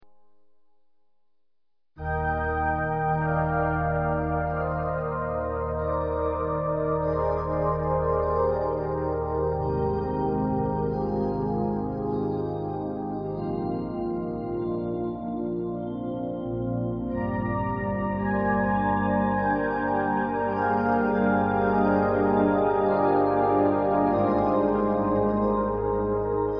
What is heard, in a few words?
harp